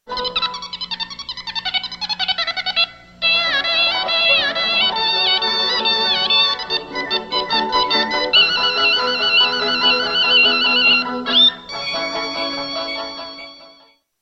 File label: suona